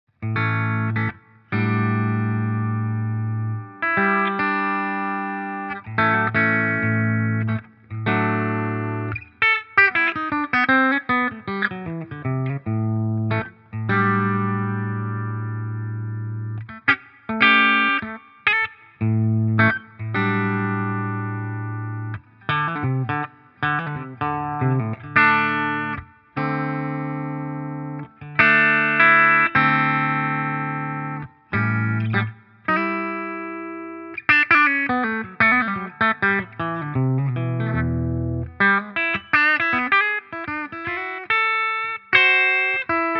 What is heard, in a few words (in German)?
Harley Benton Guitar Kit Steg clean